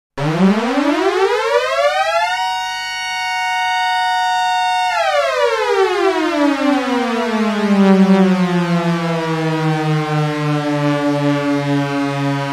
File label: Outer Space Alarm